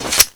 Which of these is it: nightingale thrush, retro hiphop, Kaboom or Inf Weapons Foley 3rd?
Inf Weapons Foley 3rd